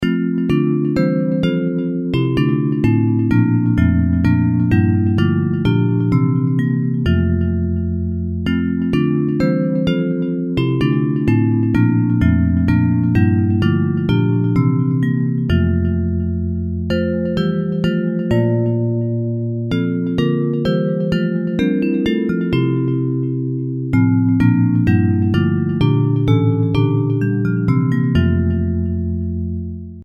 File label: Bells, Hymns